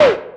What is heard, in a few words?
ui close, game sfx; vellum